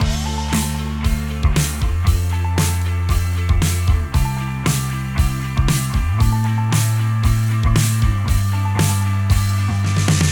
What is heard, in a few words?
Indie